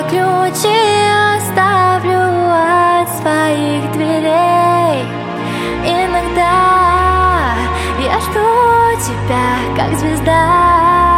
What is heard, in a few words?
acoustic